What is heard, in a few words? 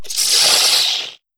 Death Monster